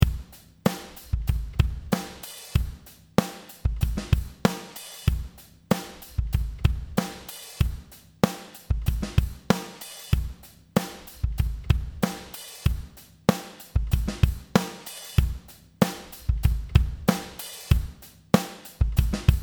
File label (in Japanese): ツッコミドラム